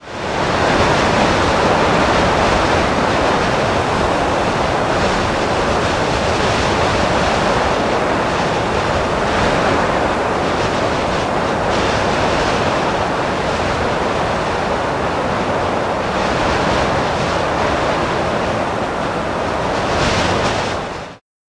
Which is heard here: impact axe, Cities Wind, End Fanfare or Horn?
Cities Wind